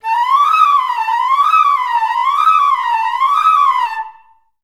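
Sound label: flute run up down D#4; Best Service; Peter Siedlaczek's Advanced Orchestra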